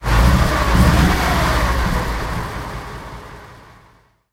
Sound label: m18 engine load, tanks; engines; War Thunder